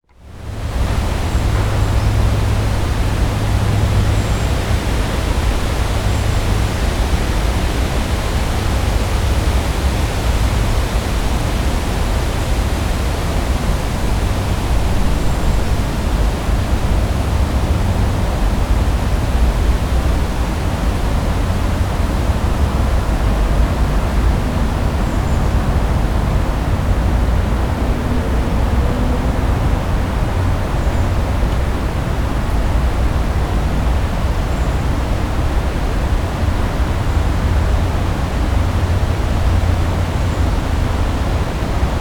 Forest Traffic Hum Low Rumble City Wind Trees White Noise